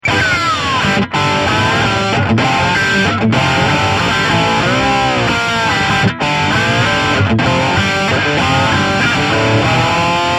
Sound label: Dragon Crunch Slide